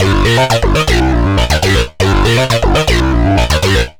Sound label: Synth, Prototechno; Sonic Foundry; Synths